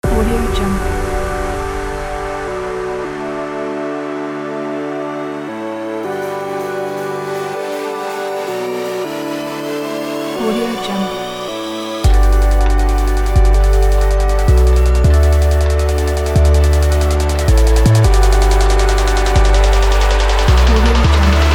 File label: the future beat